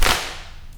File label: kick